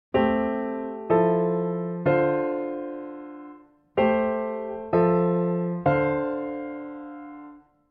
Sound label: voicing 3rd